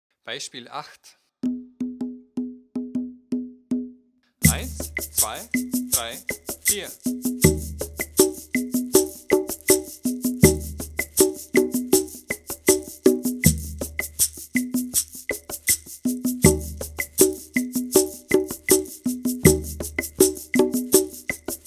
viererrhythmus

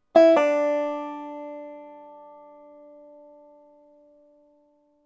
das pull off

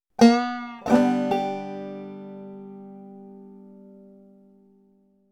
das bending

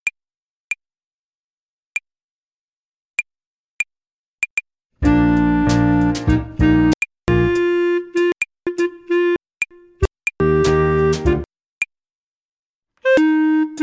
tempo und rhythmus uebung hihat play along

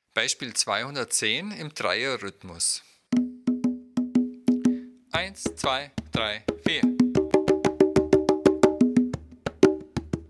shuffle rhythmus dreierrhythmus

